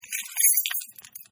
Key rattle, Batch Of Keys Being Pick Up And Rattles; Jangling; Jangling Sound Effects; Objects